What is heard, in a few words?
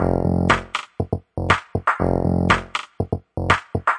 phatty bassline clapper, 120 bpm